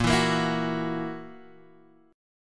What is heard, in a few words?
E Suspended 2nd Flat 5th; 6,7,8,9,7,x